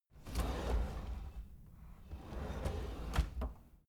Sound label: drawer kitchen